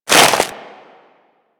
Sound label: Hammer Pull; Warframe; Grineer Hammer Boss; Foley